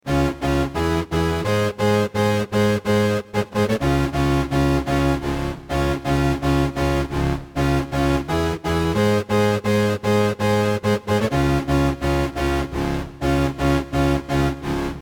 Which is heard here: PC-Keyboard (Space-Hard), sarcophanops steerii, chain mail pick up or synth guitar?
synth guitar